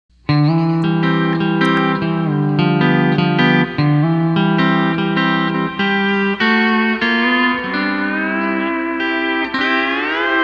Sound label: E9th Slow Waltz